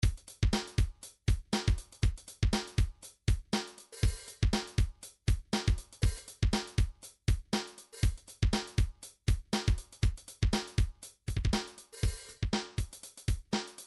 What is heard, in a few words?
drums percussion; midi vol 4 drums percussion